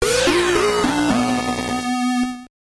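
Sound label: Jingle, Super Game Boy; Pinball Dreams; Game Gear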